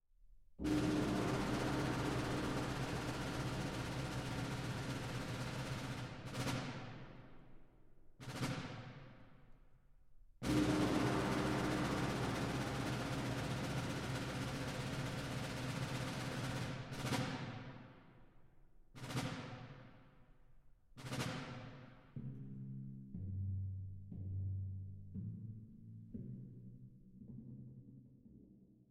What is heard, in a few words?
Fanfares Liturgiques; Brass; Brass of the Royal Concertgebouw Orchest